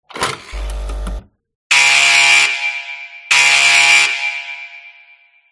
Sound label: cell control